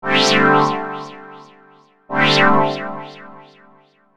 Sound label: Synt; FUNKIN&GROOVIN'